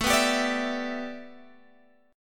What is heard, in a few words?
A 7th Suspended 2nd Suspended 4th Guitar Open F; A7sus2sus4 strummed